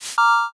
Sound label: world move